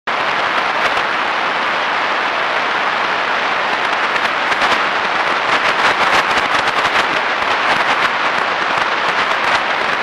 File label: jupiter s burst 20mhz